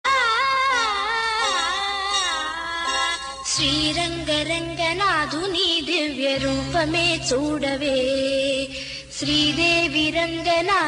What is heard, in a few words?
ringtone; sri ranga ranga